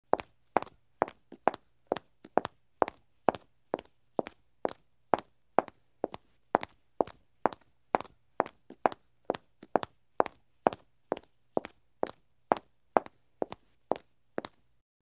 Footsteps Concrete

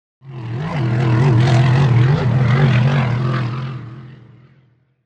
Power Boat By Fast, off shore power boat

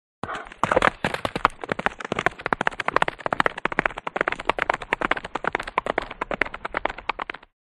Away Pony Start Away

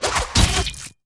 Beheading Sword, whooshes & swishes

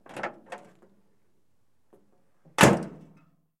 1947 Dodge Door; dodge pick up 1947

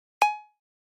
Flash Beep HF Elect

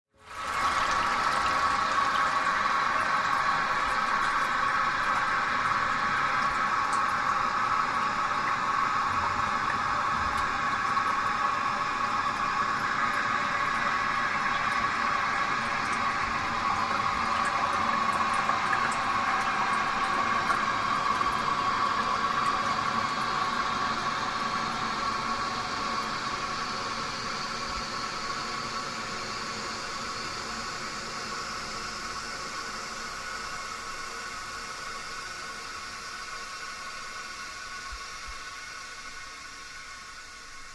Water Pipe Heavy, pipes

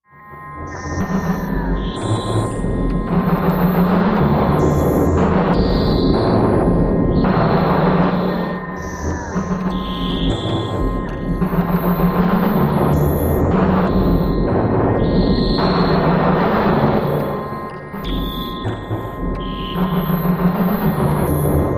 Receptor Machine Deep, sci fi ambience

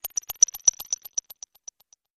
Digital Text Data Drop, stinger

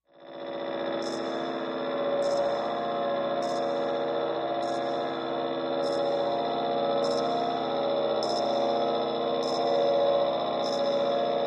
Bolt Polisher Machine

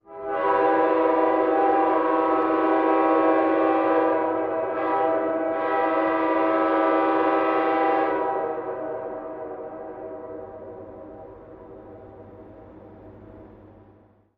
Train Horn Freight

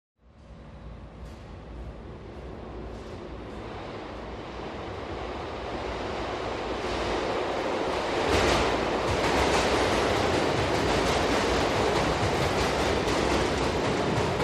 Subway Stop Away, subway

